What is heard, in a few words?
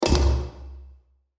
Accent Ethnic Percussion; Drum Sound Effects